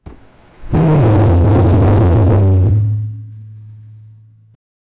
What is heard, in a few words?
stun sound loud bong